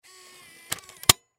Camcorder Tape, Camcorder Tape Deck Close Mechanical Movement; Sony Mini Dv; Camcorders; Technology; Blastwave